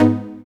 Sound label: TECHNO Eb3, dubstep; Jack Sparrow Deep Dubstep Progressions